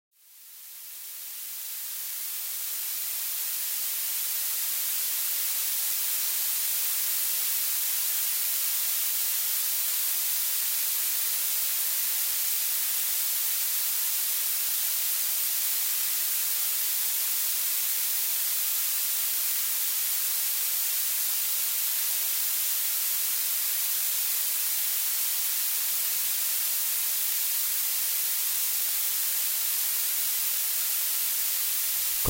blue noise rumore blu

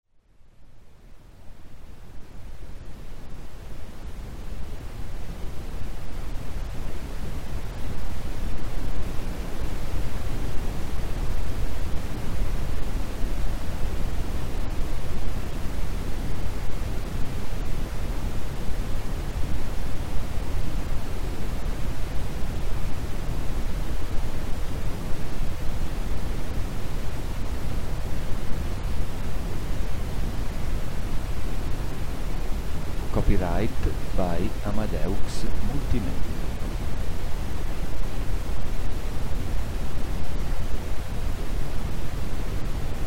brown noise rumore marrone

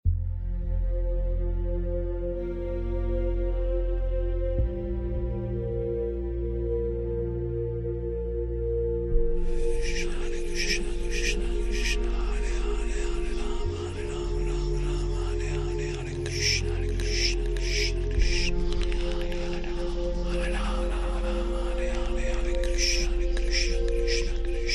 maha mantra x108 asmr

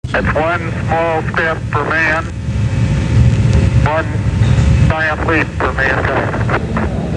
nasa step

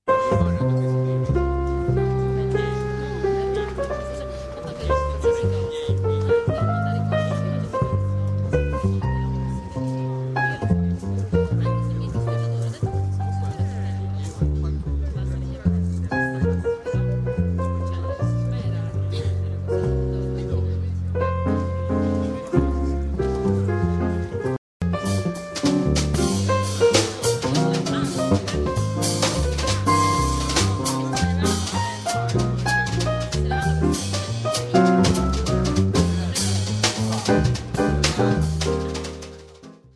Live Jazz Musicians, Olofonia

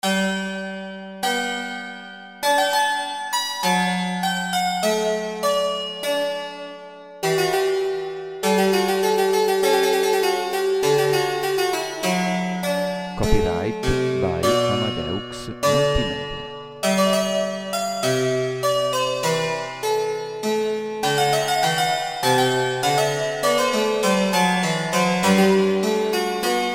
Goldberg 988 aria 432hz

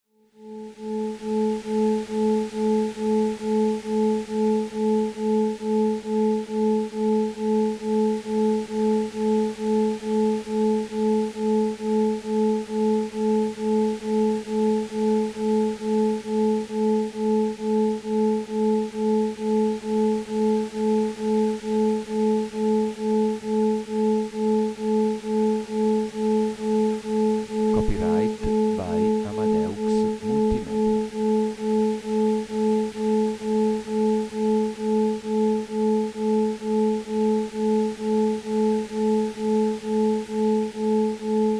delta binaural

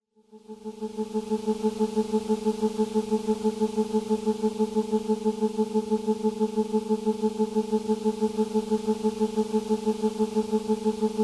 theta harmonic

